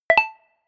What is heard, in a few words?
notification bleep; kommunikation; signale